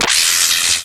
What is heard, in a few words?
scanner pain; Source Engine; video game; npc